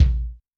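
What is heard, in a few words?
bass drum, Kick, Yamaha DTXpress-II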